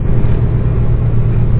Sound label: machine